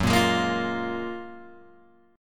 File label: F sharp Major 9th F sharp, Guitar Open G